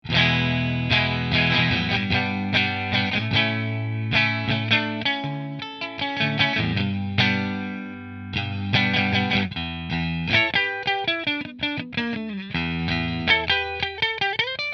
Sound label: Grosh Strat Position 3 Fender